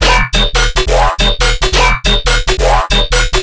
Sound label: bass loop E 140; Virtual Riot